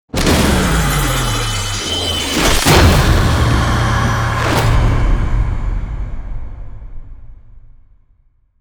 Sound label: Revive; Warframe; Tau; Cinematics